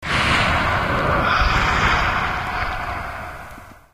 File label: talk, alien voice